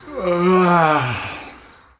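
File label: sound of waking up yawning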